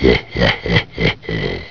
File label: laugh; sebulba